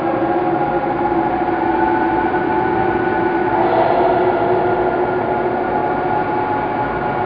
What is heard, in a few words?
Sci-Fi Background